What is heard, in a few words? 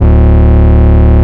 Bass D, Root D